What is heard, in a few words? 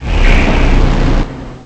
portal beam shoot; Garry's Mod; hl2; citadel